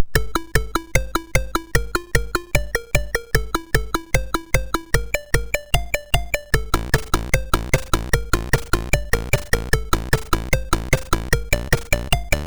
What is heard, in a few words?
sid, MOS6581R2